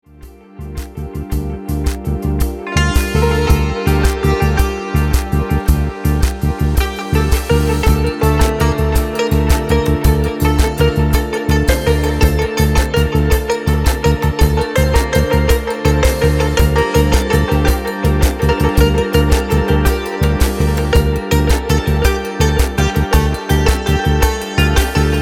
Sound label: Santoor; Zang